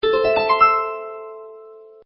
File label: start; CrazyBrick